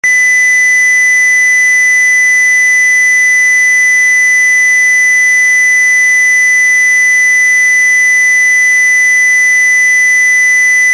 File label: Siren Tone ALARM; UNITROL